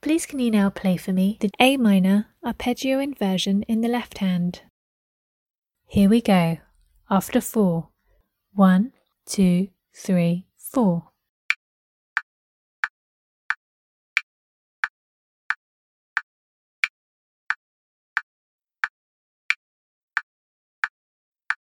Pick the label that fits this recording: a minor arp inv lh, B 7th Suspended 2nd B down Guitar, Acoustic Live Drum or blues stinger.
a minor arp inv lh